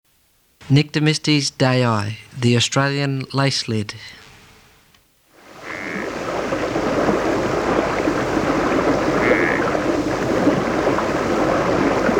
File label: Litoria dayi